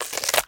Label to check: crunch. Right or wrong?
Right